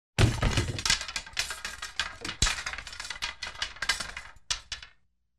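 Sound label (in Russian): в стойку с киями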